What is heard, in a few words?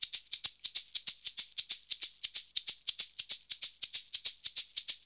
SHK, Shaker; Univers Sons; Basicussions